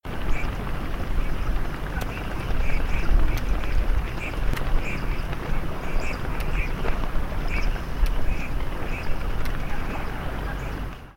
kolbenente

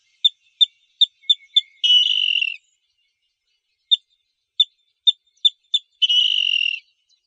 unbekannt, vogelstimmen

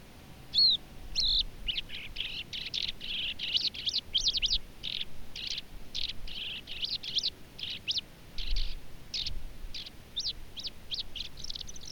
stummellerche

